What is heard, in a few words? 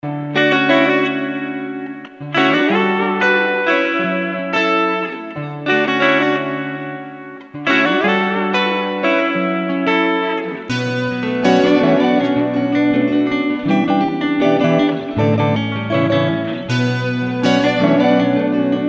Smooth Layered Guitars